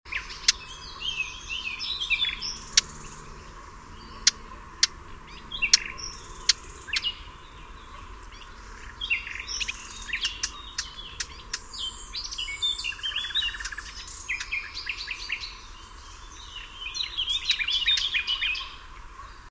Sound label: Sylvia atricapilla; SETE